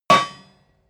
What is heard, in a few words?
metal impact midsize rich